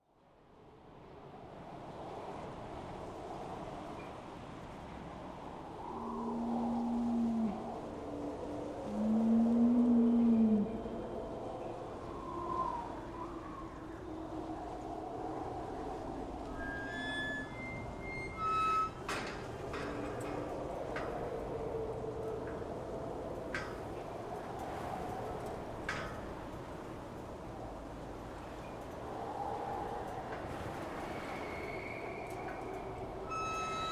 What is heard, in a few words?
ambient